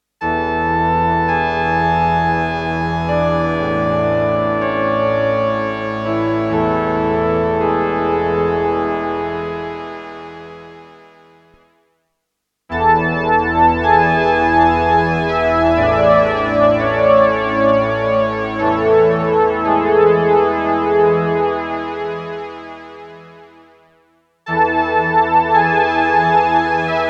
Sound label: Juno60 Stereo Synthbrass; June60